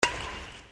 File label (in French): frappe faible